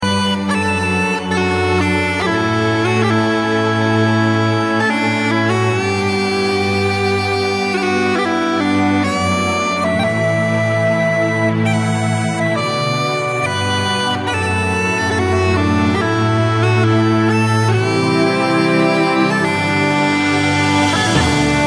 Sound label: bagpipe